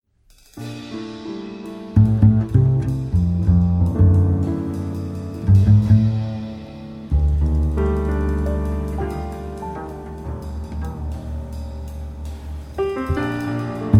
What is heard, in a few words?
Lullaby